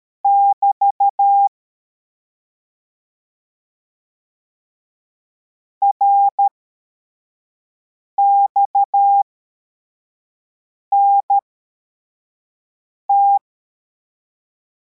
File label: cw, morse